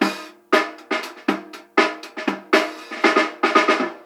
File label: JAMMAR 120; AMG Gota Yashiki Groove Activator; JAMMER 120